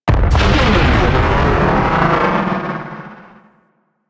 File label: Explosion; DE; Warframe; Weapons; Archwing; Powers; Archwing Big Boy